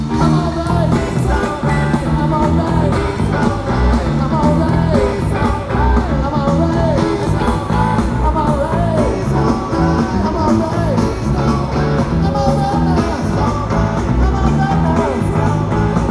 Alumni Blues; Camden, NJ; Susquehanna Bank Center

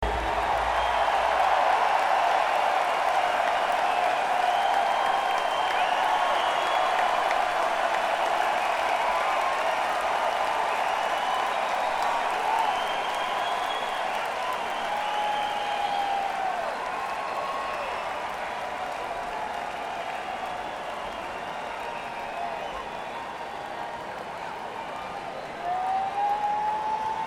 Crowd; Mansfield, MA; Tweeter Center Boston

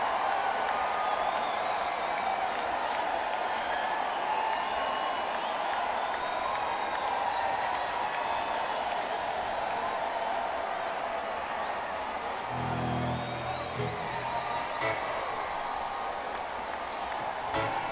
Crowd; Miami, FL - American Airlines Arena

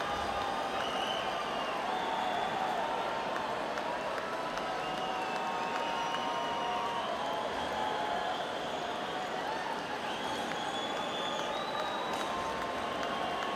Crowd; Cuyahoga Falls, OH; Blossom Music Center

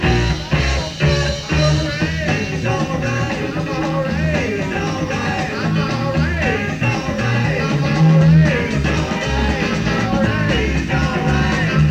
Burlington, VT; Nectars

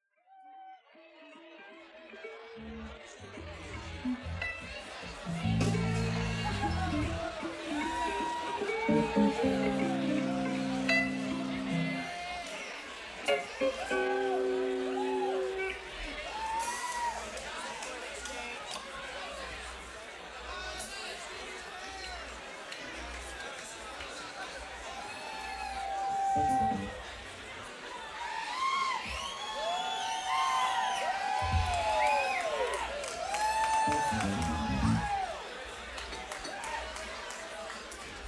Crowd; Winston-Salem, NC; Ziggys

